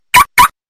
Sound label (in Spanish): alarma activada